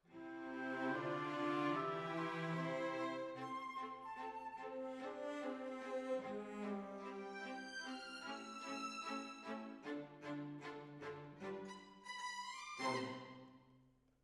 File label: Decca, Jazz for piano